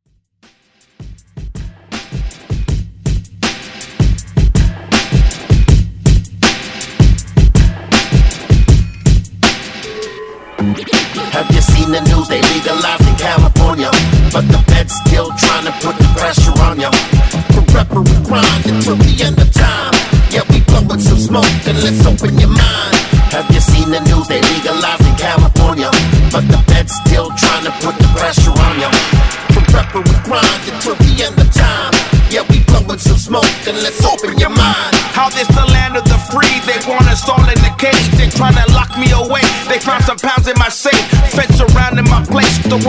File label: Redrum